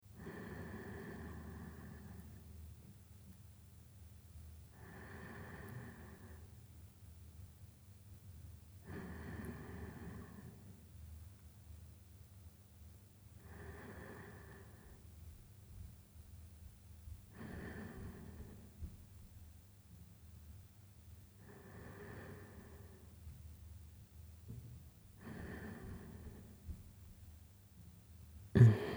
寝息ボイス

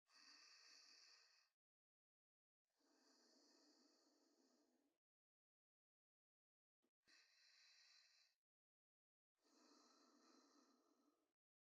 寝息ループ用

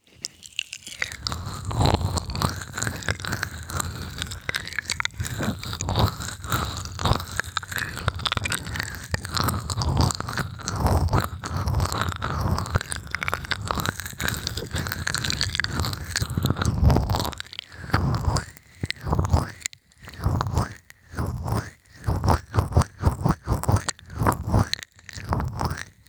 ぞりぞりMIX, ASMR

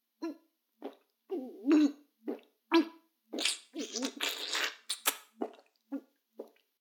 フェラ音声; 逆時間停止されて

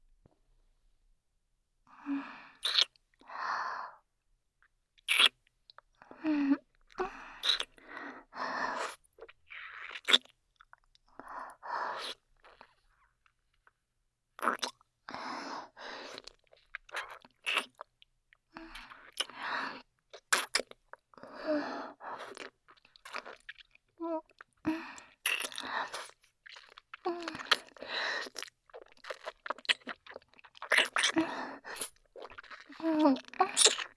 ディープキス

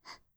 ブレス ショート

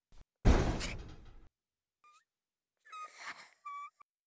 頭をぶつける

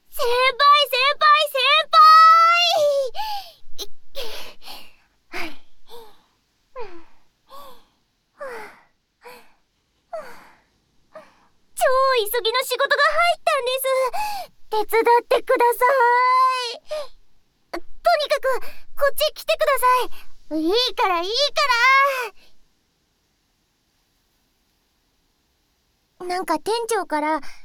スイカカット ～スイカカットASMR～

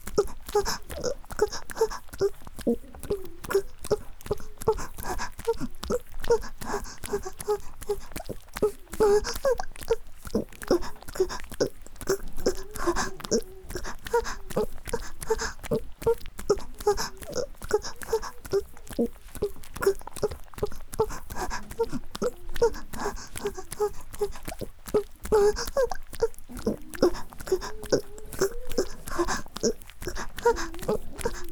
田舎の古宿ン娘の夜伽 宮崎弁 雨降りバージョン ギシアンループ

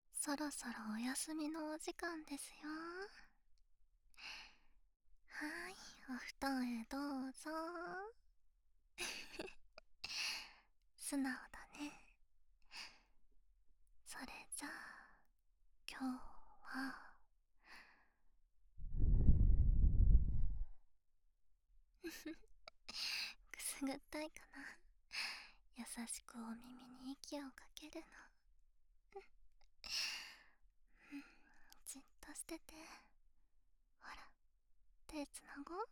たっぷり耳フー添い寝 右耳; 左右反転バージョン

